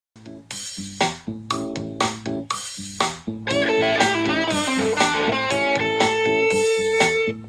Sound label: blues style guitar lesson